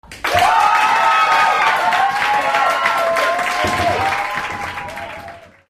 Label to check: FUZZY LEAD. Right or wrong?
Wrong